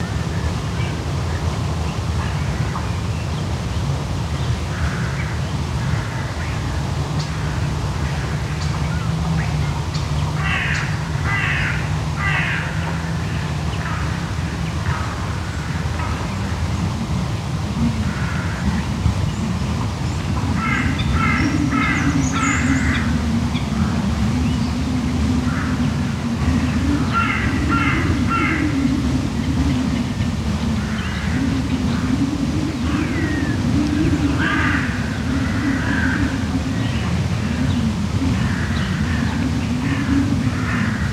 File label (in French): Amb Campagne; French